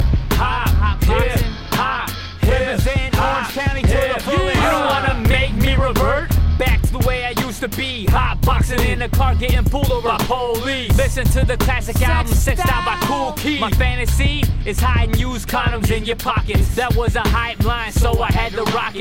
Hip Hop

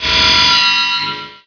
Metal Screech

